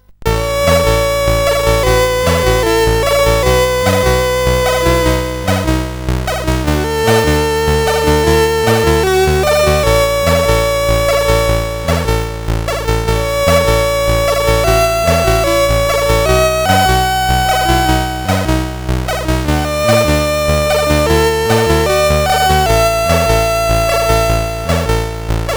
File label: sid 8580